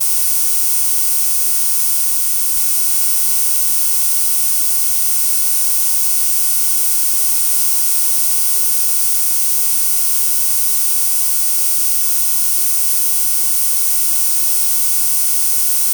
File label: Cable Box; Electronics Of My Great Grandma's House